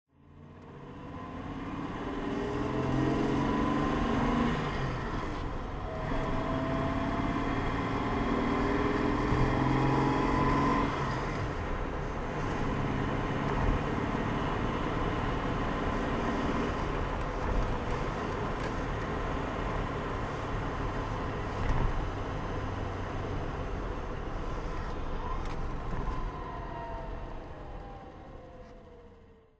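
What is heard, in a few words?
jaguar 420 driving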